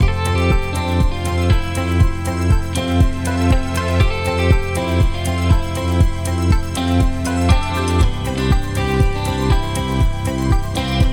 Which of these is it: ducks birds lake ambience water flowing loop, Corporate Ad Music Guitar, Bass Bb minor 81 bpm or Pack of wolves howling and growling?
Corporate Ad Music Guitar